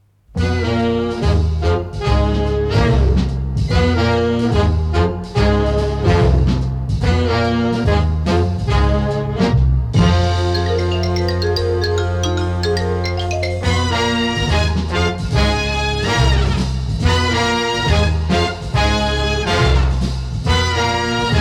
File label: Orchestra Moody Minor